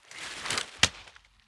Trudge Step, outdoor, Footsteps

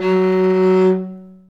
nyckelharpa F#3; SoundBytes - Early Patches

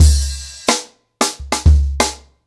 Ripple Effect 90BPM, acoustic drums

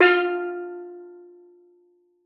F3, STEEL DRUM; Xsample VOL-4

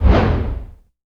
cinematic impact wipe

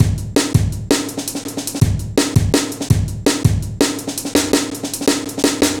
Heavy Live A, Drum Loops, Jungle; Zero-G Jungle Warfare 1, 2 & 3

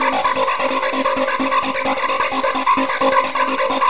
130 bpm

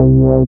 SYN 202, MC-202; Sound & Vision - Gigapack I CD 2

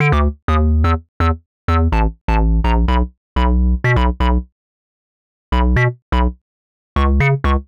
Hard Synth Bass E, 125; PowerFX - Nu Jazz House

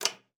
KLPB F4, ALTOSAX; Xsample VOL-2

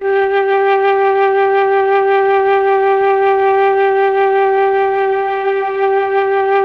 MELLOTROF

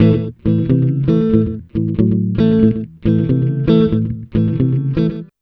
BLUES, Acoustic Guitar Loops

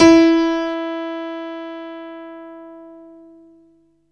PIANO E3; Best Service Alpha Dance vol.2

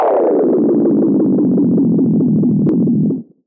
Tape Delay Feedback; radiosonics

